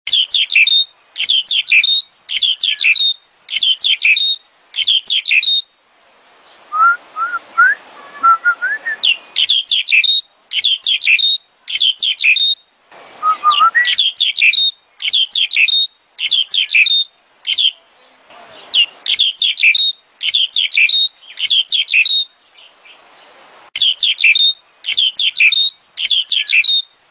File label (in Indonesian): jalak mandarin atau jalak hongkong